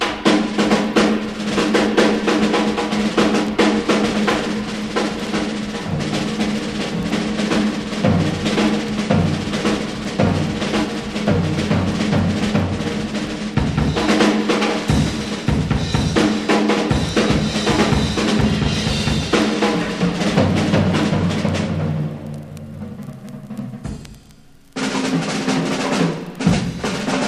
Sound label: wild stereo drums; SAMPLING SOURCE